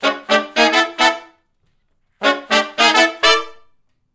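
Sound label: Funk Riff (D) harmony, Horncraft for R&B; Sonic Foundry (Sony Creative Software)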